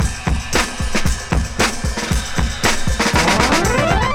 116 bpm high quality breakbeat c key, kick tuned to the C note